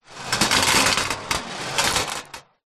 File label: leisure marbles multiple roll on metal